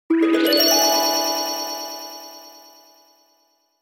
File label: Magic harp glissando